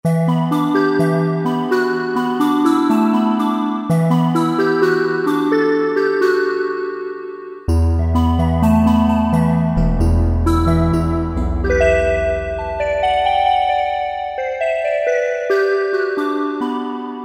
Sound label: SC Icedrop, Yamaha TG77; Factory Presets